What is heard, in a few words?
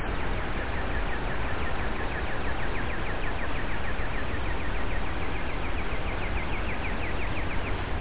Secretary Island mystery call